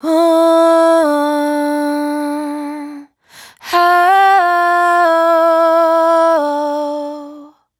riff 90 Dm, Vocal, Dry Vocals; Raw Vocals; Slate Digital Omnivox Vocal Sample Pack

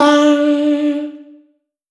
Vocal one shot D; Slate Digital Omnivox Vocal Sample Pack

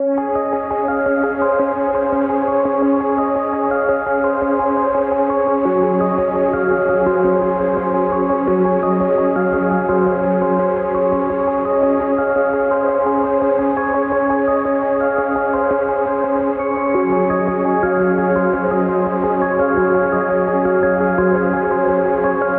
songstarter 85 Db, Pop; Slate; SD PASADENA Pop Sample Pack

